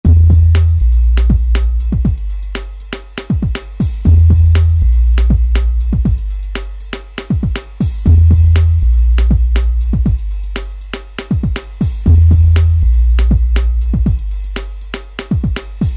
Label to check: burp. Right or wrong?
Wrong